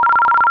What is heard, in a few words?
phone call